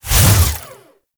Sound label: Mech Melee Swing; Nechro Mech; Vehicles